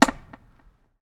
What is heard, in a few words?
jump